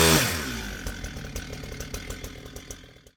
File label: running, chainsaw; DayZ Epoch